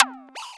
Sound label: fm dyn note c5